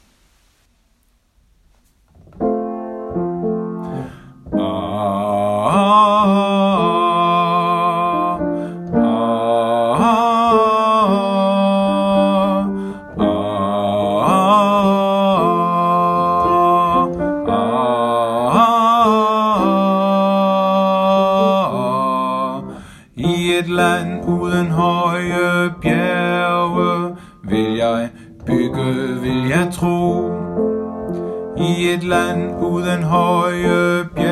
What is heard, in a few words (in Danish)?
Bas 2; Hoje Bjerge